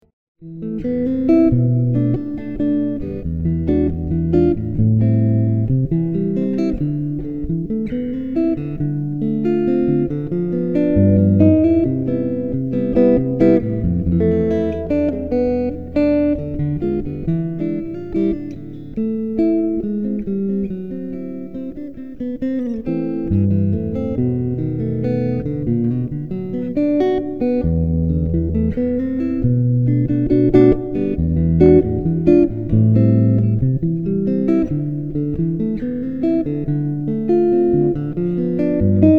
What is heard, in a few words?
Jazz fingerstyle on the electroCoustic